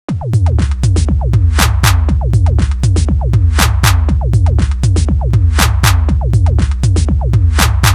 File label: boss music; touhou pride jam 2021; st1 boss